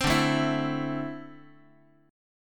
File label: D 7th Suspended 2nd down Guitar; D7sus2 strummed